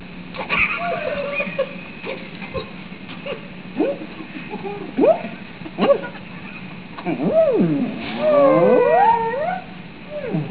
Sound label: crack tubas